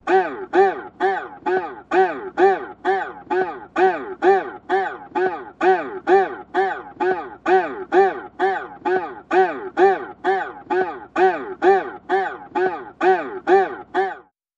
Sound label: Boing Boing Fast